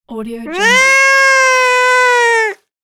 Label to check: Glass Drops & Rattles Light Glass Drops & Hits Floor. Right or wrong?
Wrong